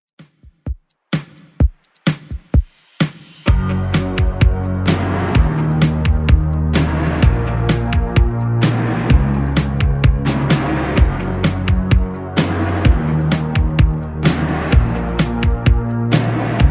80s 128bpm; Redrum

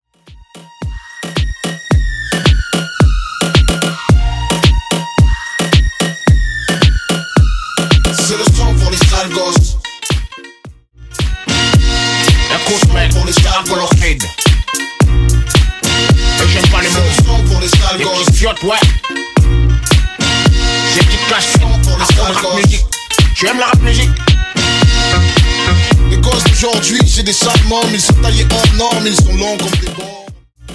Moombahton 110bpm; remixplanet

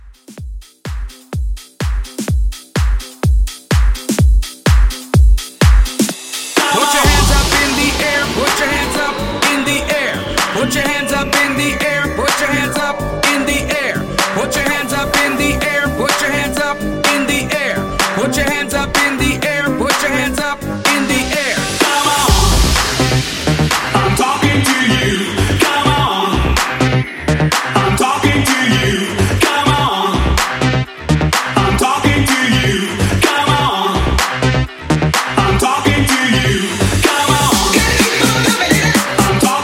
Club Hype 126 CLEAN

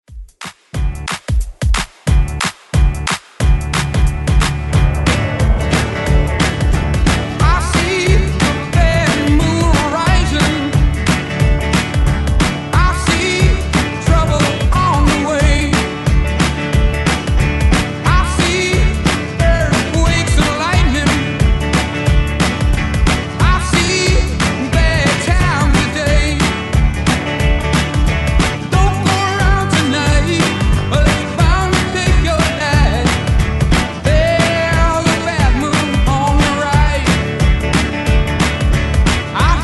60s ReDrum 90 Bpm; DJDOLLS

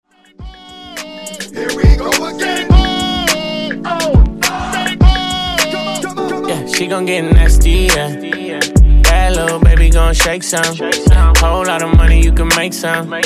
104Bpm; Dj Intro Outro

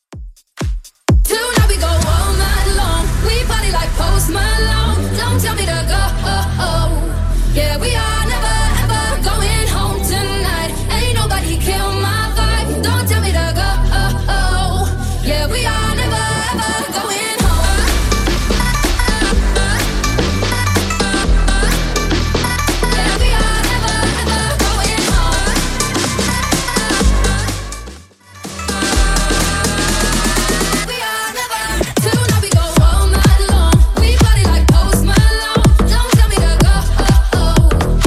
House 125bpm; Bootleg

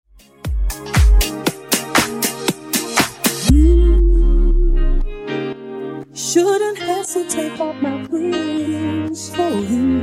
Disko Funk Remix 118 Bpm

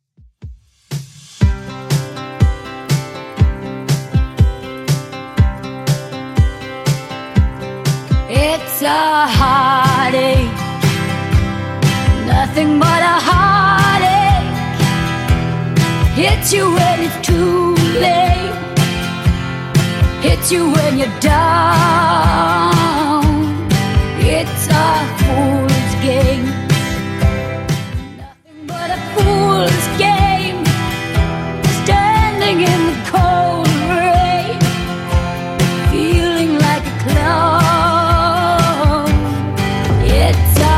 70s Redrum 121bpm

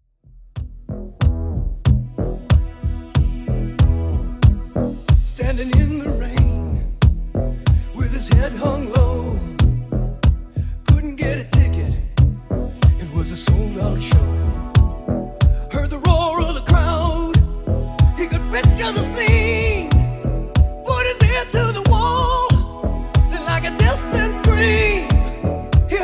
80s Rock Redrum 93bpm

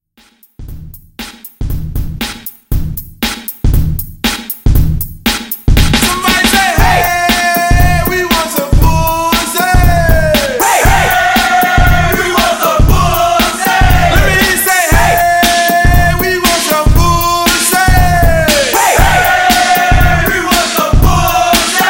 Old School Redrum 118 Bpm

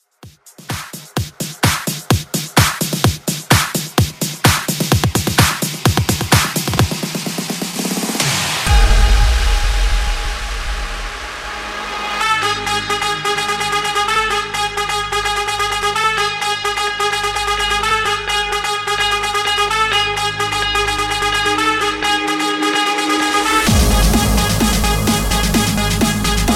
Trumpet Bootleg House

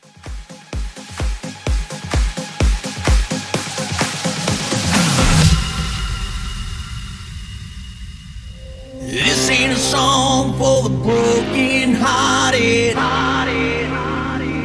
Bounce Hybrid 128

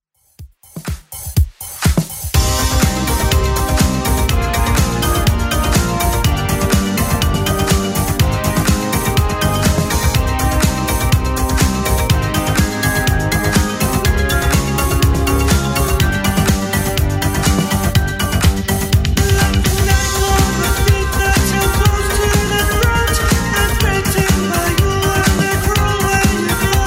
80s Club Edit 123bpm

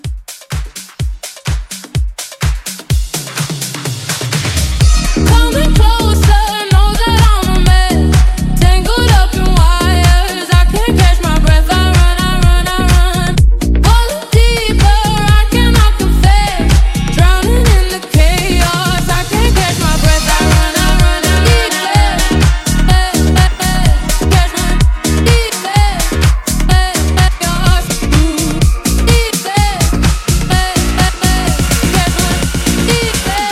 Mashup, 126

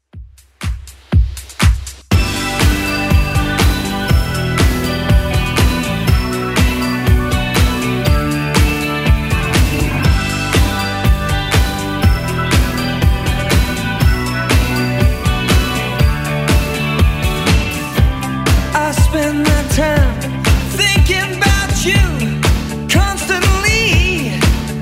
80s Club 121 bpm; 80s Club Edit

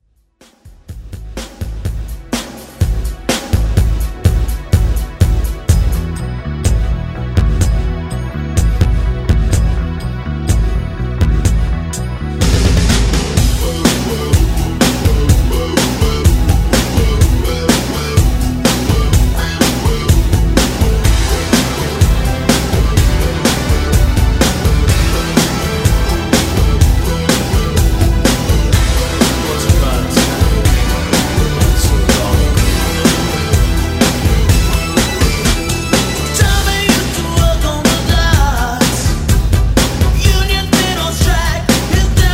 80s Rock Quick Redrum

